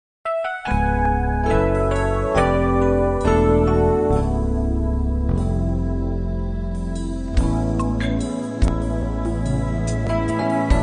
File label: jazz brazilian nights; sampler bossa nova